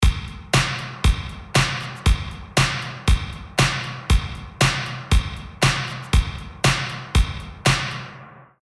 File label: drums distorted spring; fabfilter